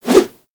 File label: melee idle whoosh; Warframe; weapons